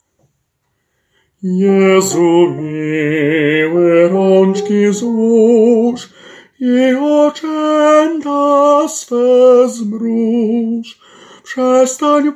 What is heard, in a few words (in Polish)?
SOPRAN